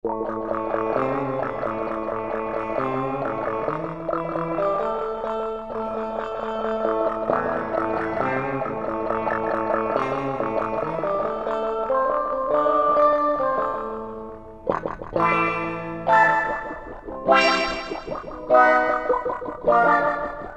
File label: STEEL CHINA